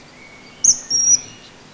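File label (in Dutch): ijsvogel, kingfisher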